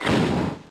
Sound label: Rock Climb; pokemon reborn e19 2022